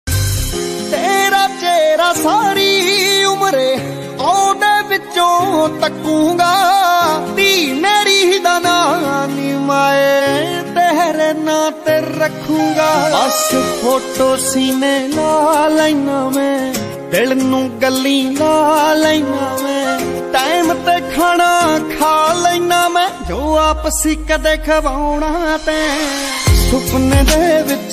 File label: maa sad ringtone